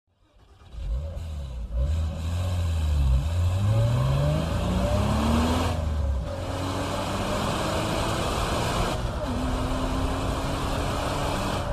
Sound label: Porsche Boxster S